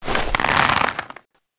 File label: draw; svencoop; custom weapons; cso; failnaught